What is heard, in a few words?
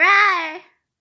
Rawr